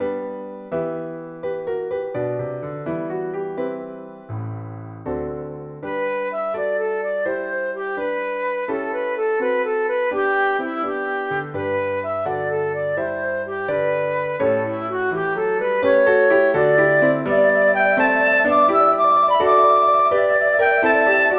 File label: Flute and Piano synth